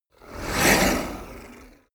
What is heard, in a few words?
whoosh speaker harsh